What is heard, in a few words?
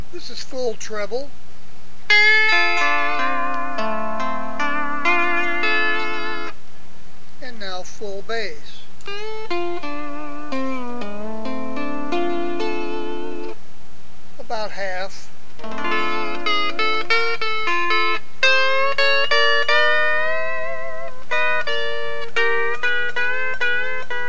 Bass And Treble